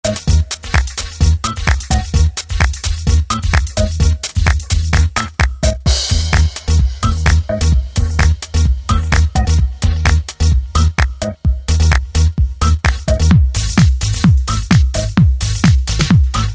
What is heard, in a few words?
techno